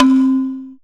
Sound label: drm, Ethnic Synths